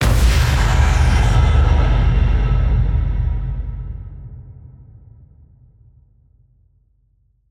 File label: Explosion